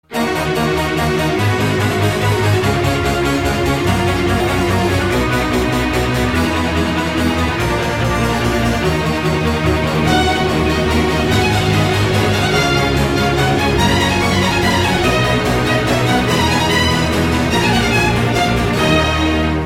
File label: Symphonic Part I